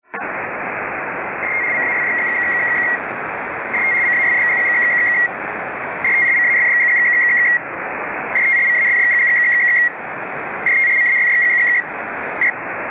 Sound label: Pactor 3 Link Establishment; winlink